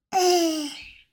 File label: eheh